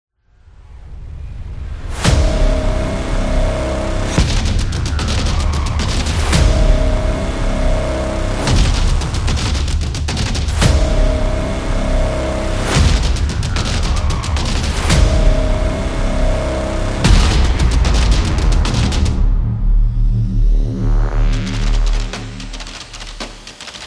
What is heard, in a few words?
The Soccer Drums